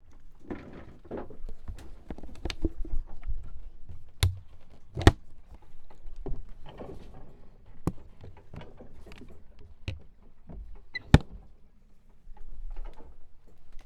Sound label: Sailing